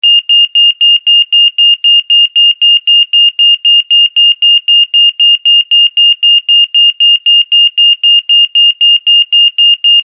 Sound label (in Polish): ton